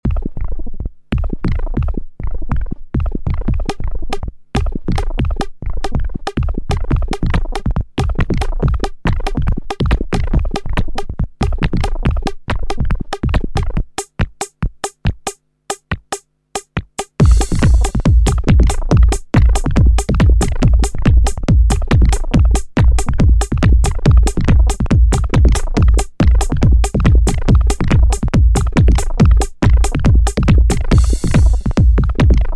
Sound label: casio vz-1 sounds blubbering external drums